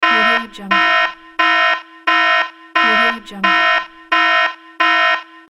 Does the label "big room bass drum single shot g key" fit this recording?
No